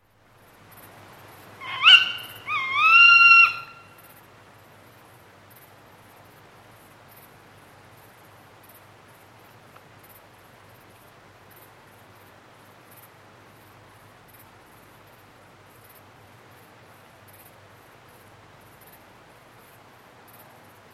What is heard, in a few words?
Tawny Owl, hunting calls with chorus of dark bush-crickets; Bird Sound Effects; BBC